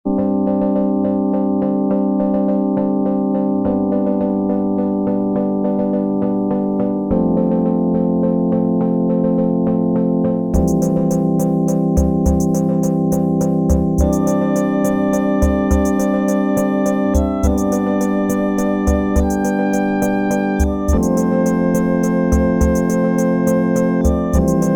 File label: rim, Elka